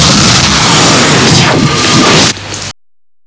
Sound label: sg disconnect; stargate